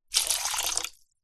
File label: foley liquid water pour metal bowl short